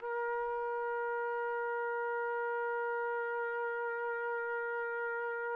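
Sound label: trumpet; Roland - LA Composer vol. 3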